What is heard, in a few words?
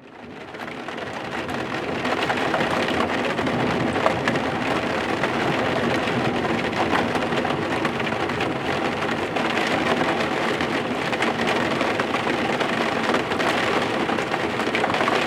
raindrops on window; best service Blue Box; rain thunder